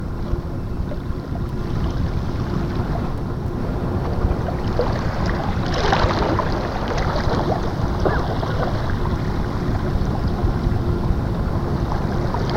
water stuff

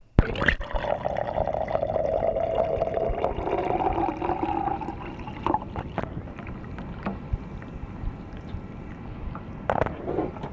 pouring

